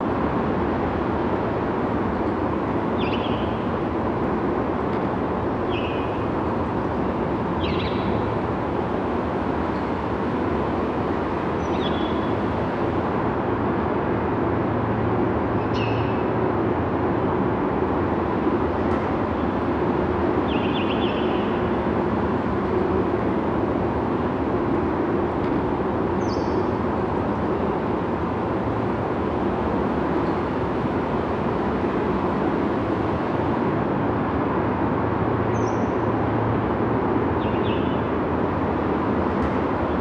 Courtyard, ambience; Ninjago

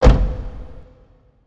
Elevator End

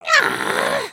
disappointed; emotes

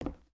Foot Wood Boot Walk, footsteps

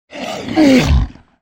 Dragon Ouch

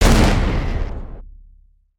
charge

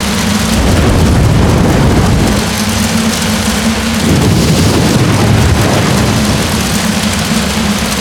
Fire Loop; ambience; Nursery

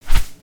Seagull Wing; creatures organic

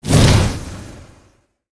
Spinjitsu Fire Swing

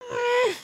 sigh

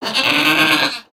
Pets Goat Angry; creatures; Lego

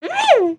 Ribbit Frog Hat Mmmm; creatures organic

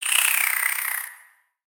Spider Idle; Lego; creatures mechanical

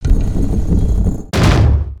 Ark Open; foley

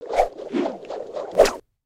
Pet Cat Teleport Twirls; creatures pets

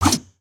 spear, weapons; aronwk collection; ndaudio

